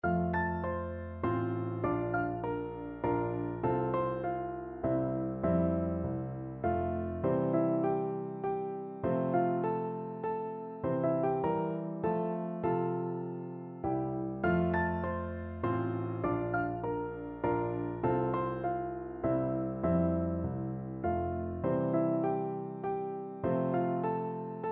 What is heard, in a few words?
mozart minuet, piano duet